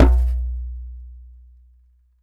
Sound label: DJEMBE BASS